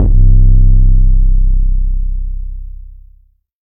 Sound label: 808 (C); Hip Hop and Trap Freebie; 808s; Ghosthack